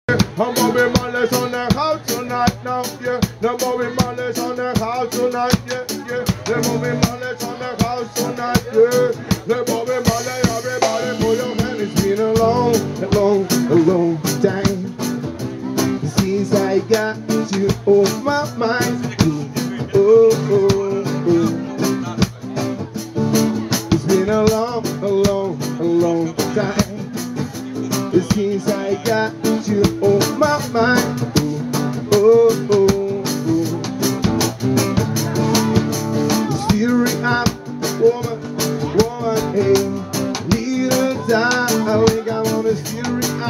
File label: acoustic; Praia Vermelhinha do Centro Ubatuba